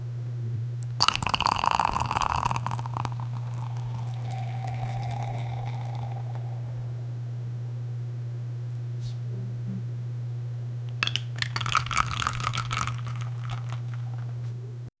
bottle cap pop gentle; human interaction

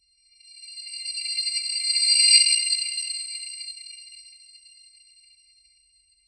peaceful blink of light

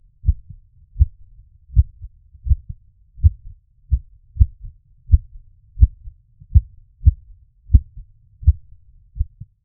extremely slow heavy, steam

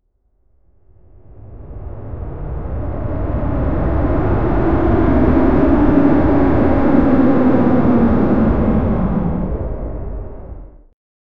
descending boeing 747 jet; airplane

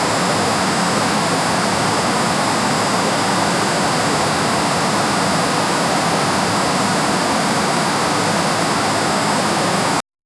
the distant roar of a waterfall in the wilderness; forest

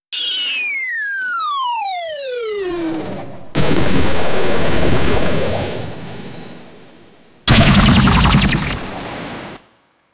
falling in the jungle and, cartoon

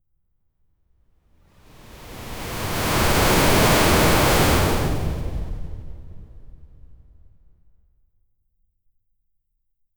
realistic dash effect, fighting game

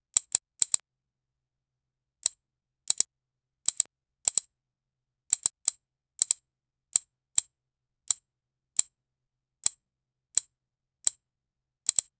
for matchcut transition i, interfaces

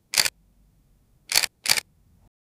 cinematic sounds of a camera

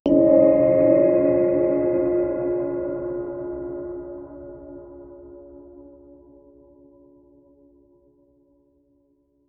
soft energetic gong hit; metallic ping

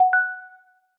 mobile

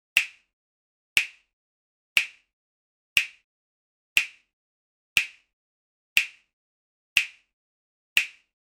lego snap sound

